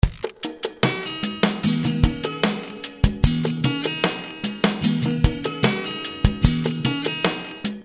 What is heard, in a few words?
Acoustic world